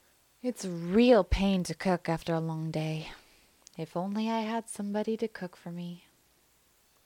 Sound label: pain